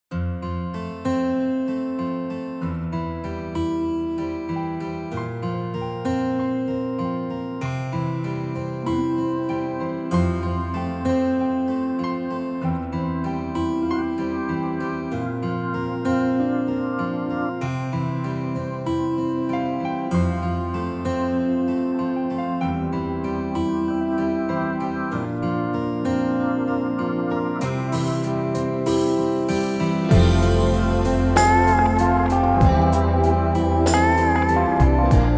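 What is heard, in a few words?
No Ld Guitar Solo Key Of F Major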